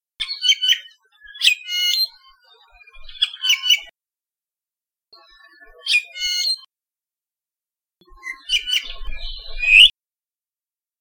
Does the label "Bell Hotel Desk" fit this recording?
No